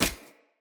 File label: Unarmed Parry Impact; Weapon Impacts; Player; Combat